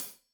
Hat Tight, Hi-Hat, Cymbals; Deeply Sampled Drums

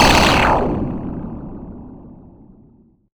Vulcan Debris, FX Atmospheres; Zero-G; Soundclash Nu Dance Electro; Acidized Wavs

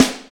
DMA XD-5, DIGITAL

